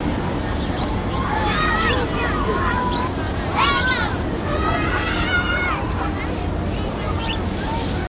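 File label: Children Play Outdoor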